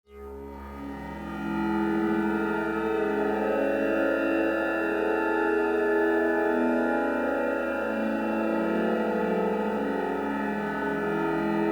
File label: weathered steps